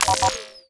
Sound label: Component Rejected; TechTree